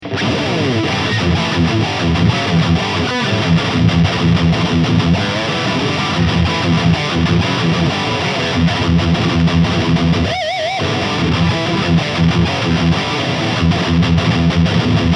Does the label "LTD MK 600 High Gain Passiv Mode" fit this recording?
Yes